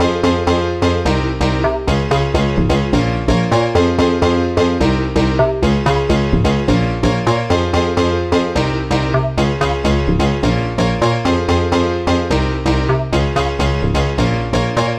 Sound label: House Piano Loop - 128 BPM F Min; Instruments; Loops